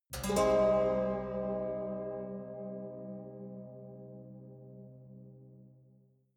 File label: haunted strings chord minor